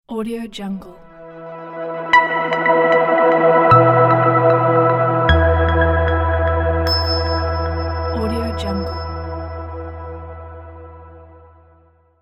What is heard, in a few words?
space logo